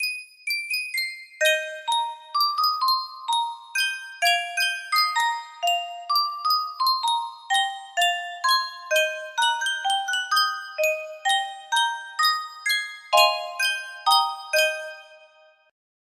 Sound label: Sankyo Music Box, music box melody